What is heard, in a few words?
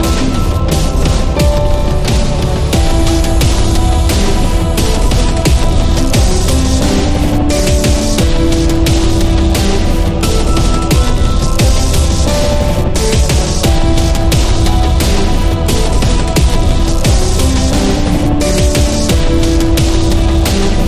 Modern Digital Orchestra; bbc